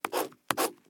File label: Biro crossing out; Sounds Visual; 50 Flat Design Sound Effects